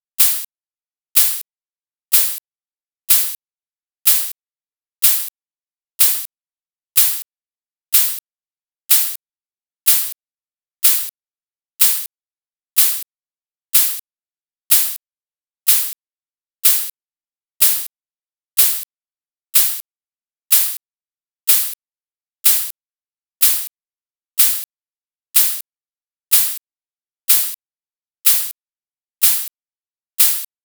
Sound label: Noise Snare